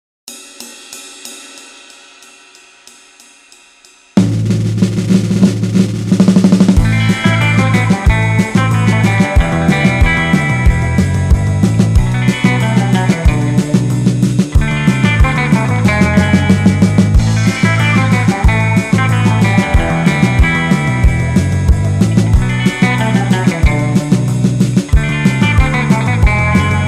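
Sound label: surf backing track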